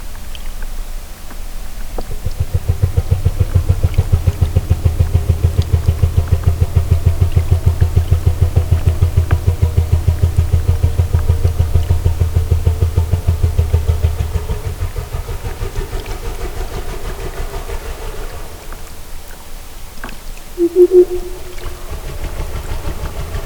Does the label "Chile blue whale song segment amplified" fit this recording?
Yes